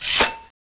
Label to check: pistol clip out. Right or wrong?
Right